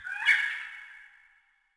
canyon eagles; ambient; World of Tanks Blitz

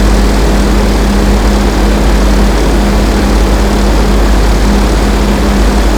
Shilka engine max; DCS

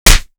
impact flesh; Modern Warfare

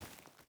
footstep barefoot forest walk; Rust

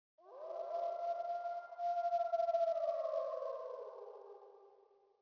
sting wolf; Rust

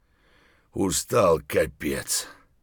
tired; Escape from Tarkov

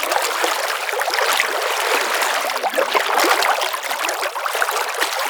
river; ambient; World of Tanks Blitz

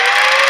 minigun spinup; Modern Warfare

